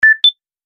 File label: Alert